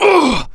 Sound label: pain; openffa; drfaustus